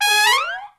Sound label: SECT BRASS FX